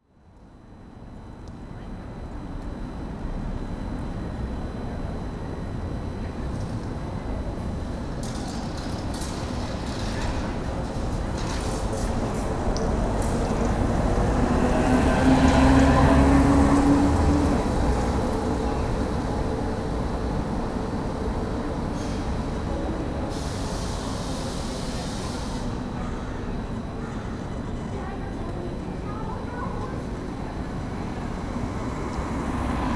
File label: Granville Street, Vancouver, Canada